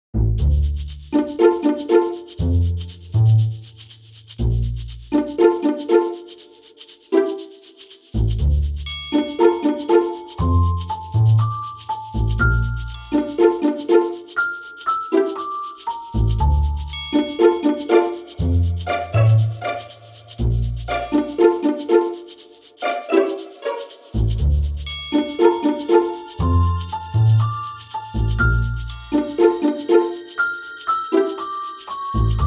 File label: Funny